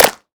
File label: good clap sound c key, percussion single hits one shots